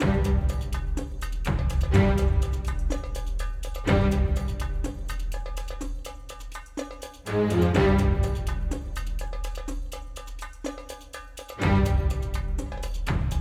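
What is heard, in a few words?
Percussion loop; Yemen Temple Explore; uncharted 3 drakes deception ps3 gamerip 2011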